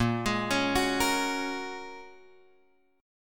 A sharp Minor 7th Sharp 5th A sharp, Guitar Drop D